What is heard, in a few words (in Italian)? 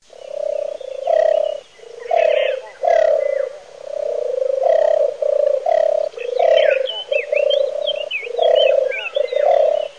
TORTORA